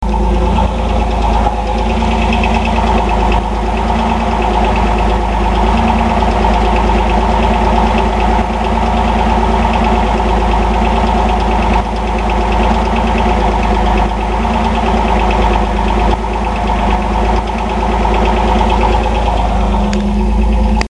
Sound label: fiero engine